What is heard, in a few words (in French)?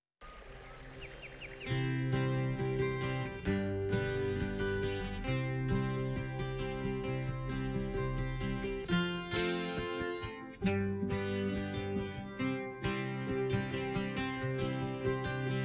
un nuage passe instrumental; Stems